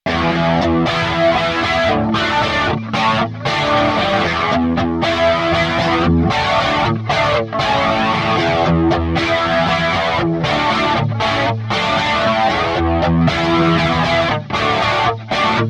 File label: Empress ZOIA Low drive